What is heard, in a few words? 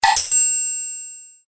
Loud SMS Text Filler